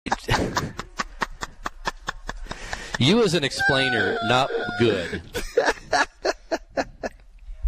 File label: death laugh; death laugh iso